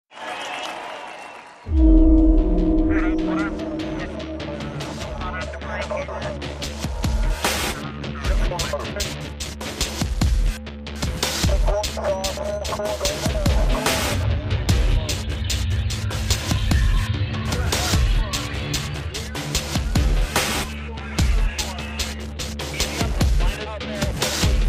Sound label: Post Race Music; Need for Speed Shift